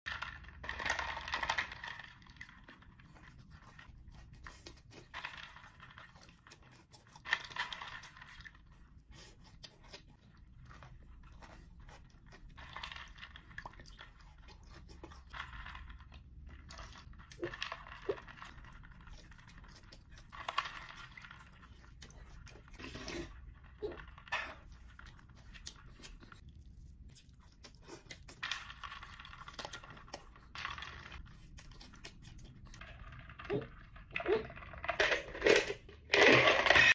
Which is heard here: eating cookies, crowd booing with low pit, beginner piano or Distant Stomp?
eating cookies